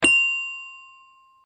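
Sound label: xylophone; Toy Story 3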